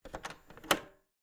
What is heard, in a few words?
Cassette player button click